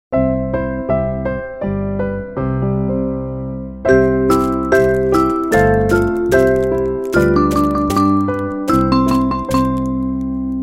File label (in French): Papiers papillons orchestre